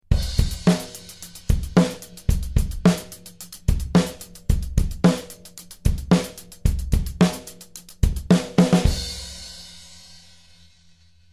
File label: 16th beat